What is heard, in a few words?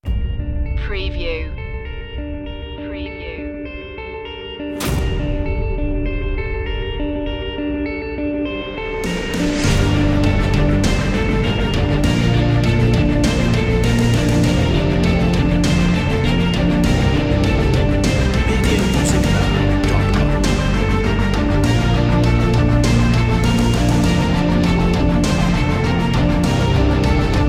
no guitar solo